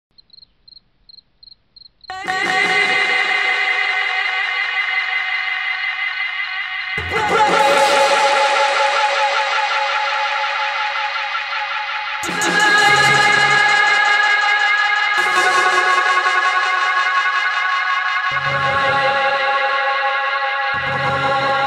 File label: Dub Trip